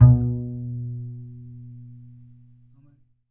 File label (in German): KONTRABASS; Alpine Volksmusik